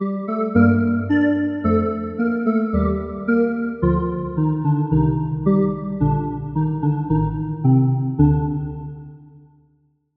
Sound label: Woodwind; Routed Sound; Serum 2 Expansion - Story Walker Vol.1